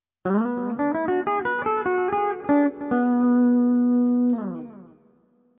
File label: blue 6th, guitar